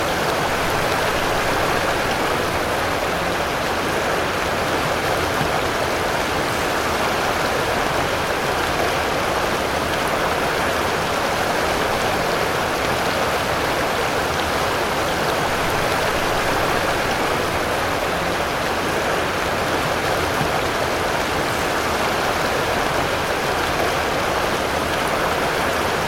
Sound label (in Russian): rev shumnogo bolshogo vodopada